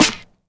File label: snare drum g key; snare drum samples; drum single hits one shots